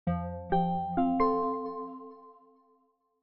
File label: Microsoft Surface startup